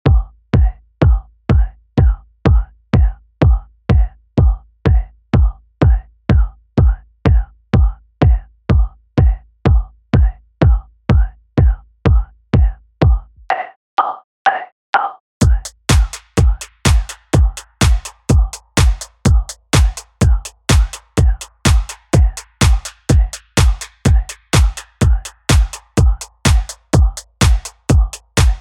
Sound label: beat whisperer